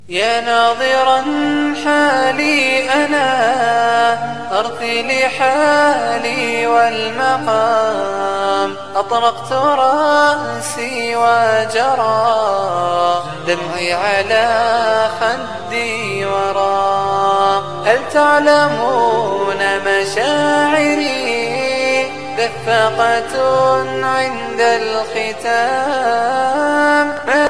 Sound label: islamic song